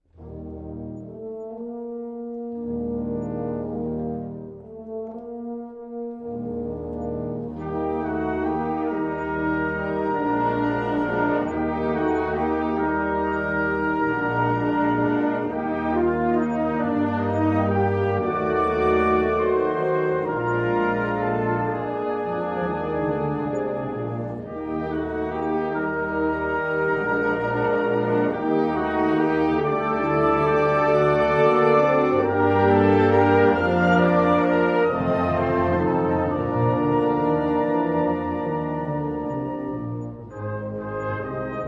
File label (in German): walzer